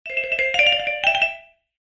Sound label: shimmer ringtone, nokia ringtones